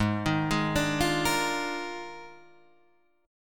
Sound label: D sharp Major 7th Suspended 2nd Suspended 4th, Guitar